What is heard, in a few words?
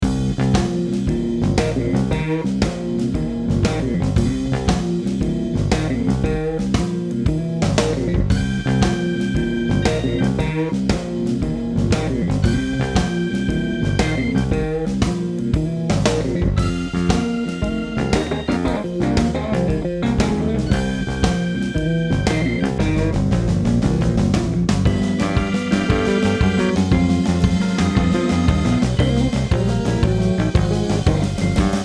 chip game bg; mahjong dongbei